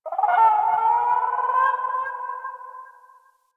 Landscape Distant Birds, Ambience; Warframe; Venus Open World; One Offs

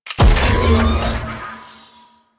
Mech Slide Start; Nechro

